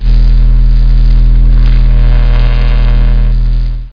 HUM

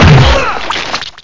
HIT GIBS; carmdemo

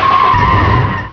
UUuhiihiichch; Burning Rubber 1.2.3

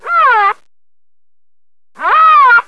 hippo; My Animated Word Book; zoo

